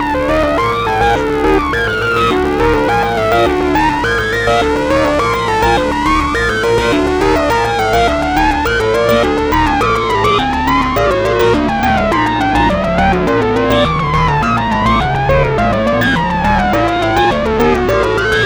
Synth Distorted; Electrocution

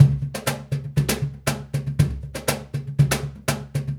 Cajun, Percussion, Drums; Essential Percussion

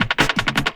LOOP SD; Ueberschall; Drum & Bass Resonance

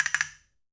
CASTANETS

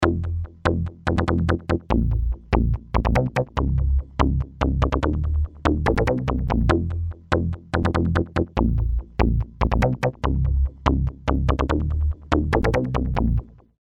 Click Bass, Industrial

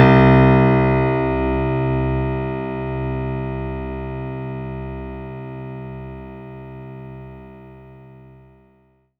C2, synth; 90 s Sample CDs; NiceBeats; SynthLine VOL-23

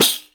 RIQ DROP; SoundBytes - Early Patches

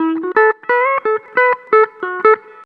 COUNTRY

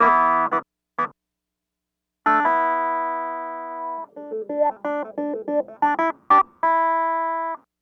clavinet, analog funk; Mixed Berry Jam; Sonic Foundry (Sony Creative Software) - What it Is! 70s Analog Funk

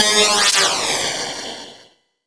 weird; Best Service - XXL Production Effects

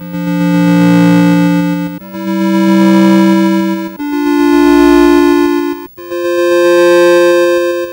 Atari 2600 Synth Harmony; 8-Bit; Chiptune

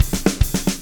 POWR, Backbeat, SHUF

